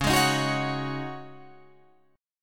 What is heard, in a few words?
C sharp Suspended 2nd Suspended 4th, C sharp, Guitar, Drop D